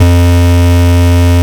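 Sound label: bass distortion F2; LIMAT LSDJ kit; LSDJ Extra Bass Notes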